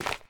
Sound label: Footsteps; smite; horus